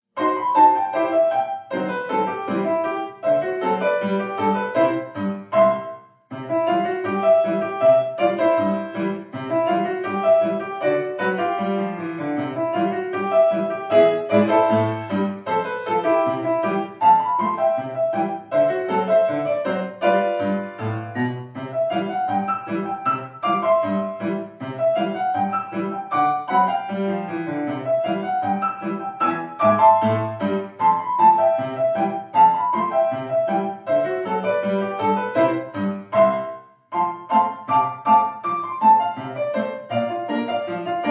steamboat rag

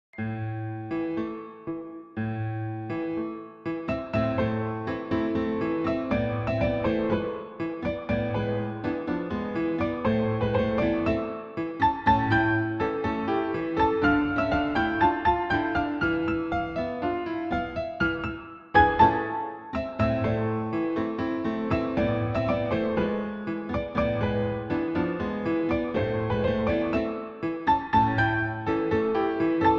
cafe tango

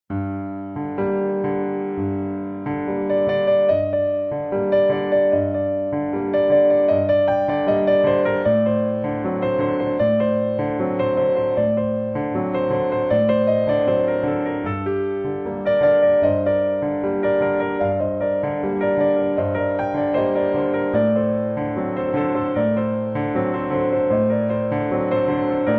tango

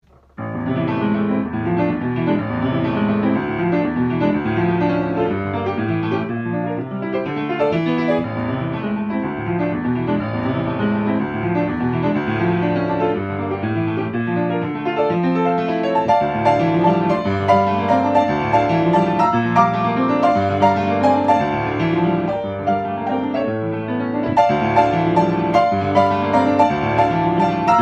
toccata tempestosa piano solo

